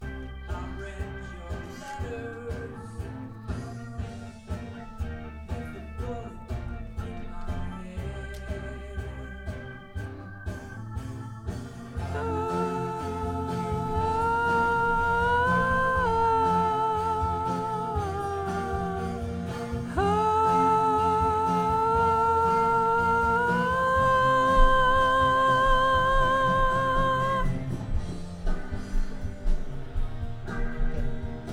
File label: VOX Double